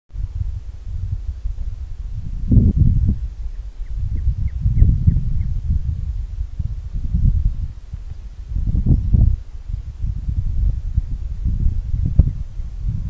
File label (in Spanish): Gallito Arena; Jorge La Grotteria